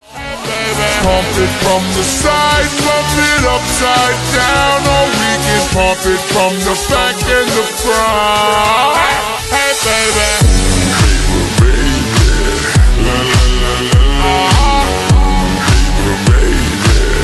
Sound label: slowed